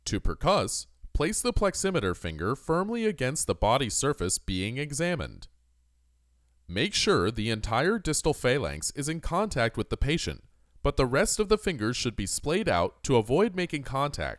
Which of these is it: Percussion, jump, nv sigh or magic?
Percussion